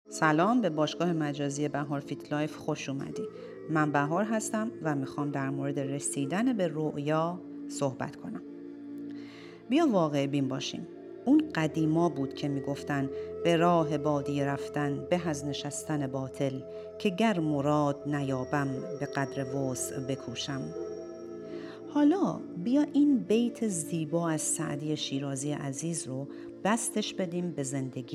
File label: effort